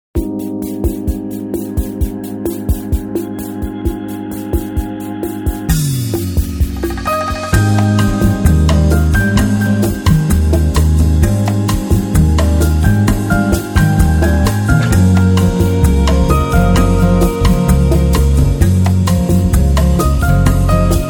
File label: BOSSA CHIC